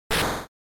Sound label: Noise; GB General